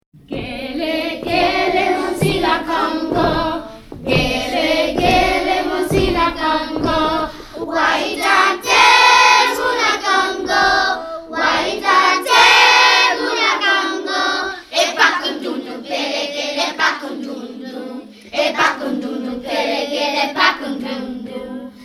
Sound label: Five Drums Congo; 2nd Grade